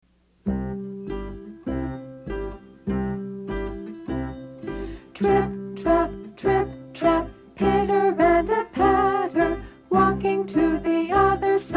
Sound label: trip trap song with voices; nanny goat